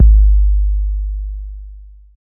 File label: Bd Sub, Kick Drums; Zero-G; Soundclash